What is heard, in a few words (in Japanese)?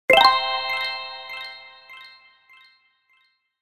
kirakira